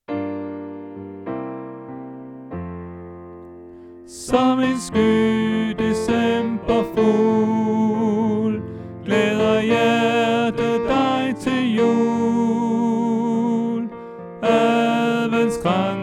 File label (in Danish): Tenor; oevefiler